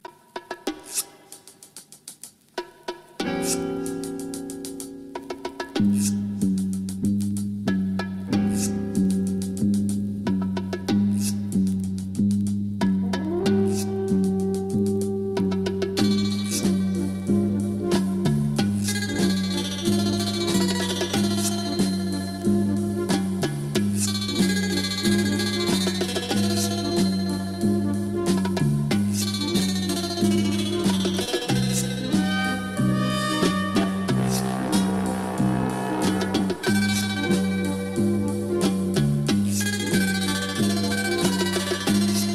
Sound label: ritmo; italian tamburo